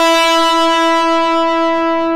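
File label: E1 distortion guitar; AKAI S-Series CD-ROM Sound Library VOL-8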